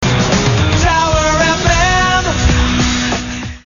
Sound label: Tower FM Jingle; Tower FM Jingles